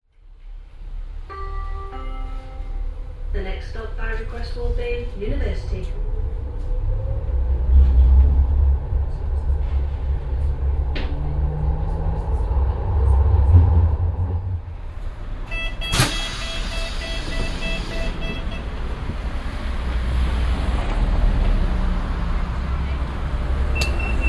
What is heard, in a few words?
sheffield tram